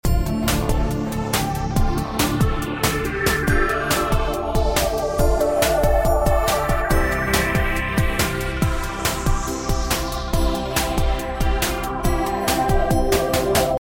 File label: GIOVE